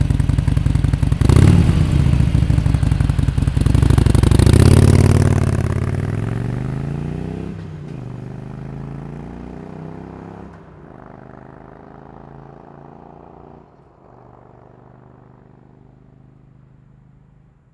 Iron 883 Take off, slip-on mufflers for harley sportster; Exhaust Sound - Stock Take Off; 2014-2018; Rinehart